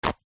household insect repellent roll on plastic lid place on; zapsplat